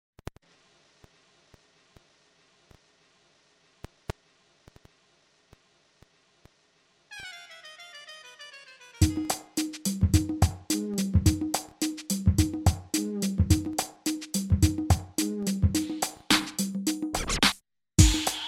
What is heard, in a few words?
clubjazz